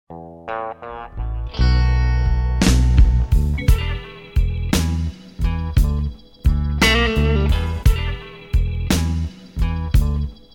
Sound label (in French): Lecture de rythmes préparée; formation musicale fin de 2nd cycle 2017; examens 2017